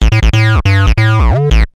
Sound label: Nord 303 Lead